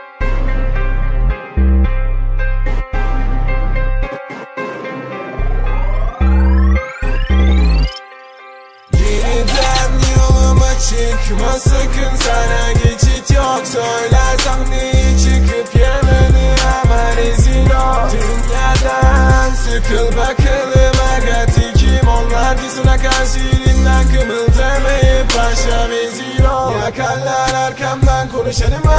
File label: ryhme tone